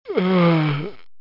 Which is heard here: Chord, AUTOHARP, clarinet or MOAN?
MOAN